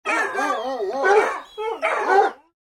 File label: dogs